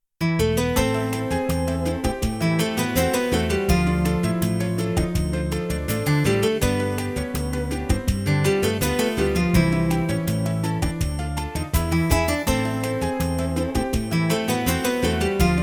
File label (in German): Musik; Urlaub am Meer; Cornelia Funke erzählt von Bücherfressern